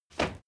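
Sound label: step crowd run loud single right, footsteps; schritte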